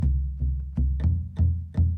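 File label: Calm Far, music; from dust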